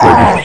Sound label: pain; vortex; terminator